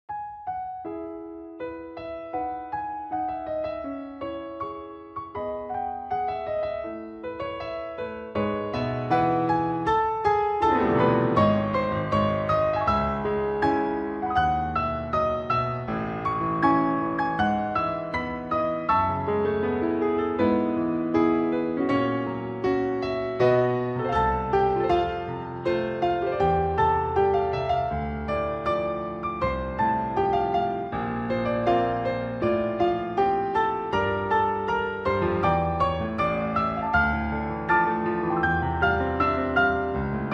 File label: piano